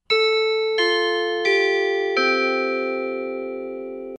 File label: tada